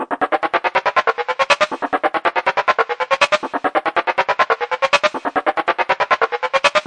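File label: ascending filterhits, 140 bpm; SYNTH AND ELECTRONIC LOOPS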